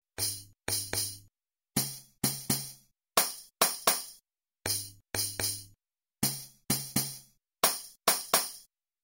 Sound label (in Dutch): Het geluid van de Tamboerijn; Muziekreis